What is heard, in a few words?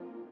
lead